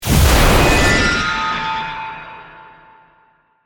Hit Complex Feedback; Hit Sound Effects; Sound Design

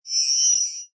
Chime Metallic Swell Fast, Scifi; Scifi Production Elements, Set 2; Lynne Music

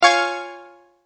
multimedia tone correct; Sound Design; McKinney Sound

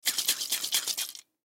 Aerosol Can Shake Short Hard; Shaking; Blastwave; Foley

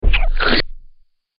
Big Muddy Splat, slimy

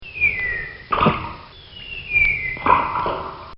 Falling Down A Well; Injuries; Combat

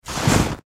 Footsteps Snow Single Step Impact Crunch; Weather; Foley

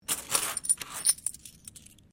Coin Penny Multiple Move Wood; Common coins; Money; Objects

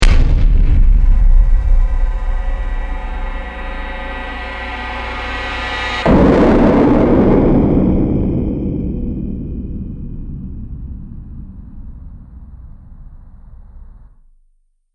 Heavy Impact Stinger, Stinger Sound Effects; sound design

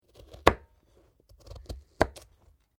Carrot slice; Food preparation; Sound Effects:Restaurants

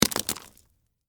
Debris Green Pepper, Guts, Vegetable; Texture; BlastWave

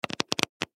Cards Flip Through, Cards Flip Through Half Deck; Casinos; SoundEffects-Blastwave; Sports

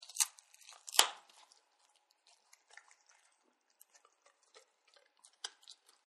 Bone Crunch, Dogs; Animals

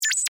Multimedia Beep, Technology, Multimedia, Beep; Beeps

